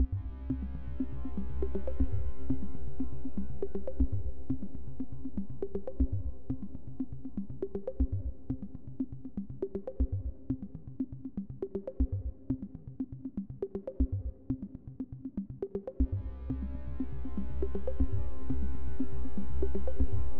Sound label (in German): musik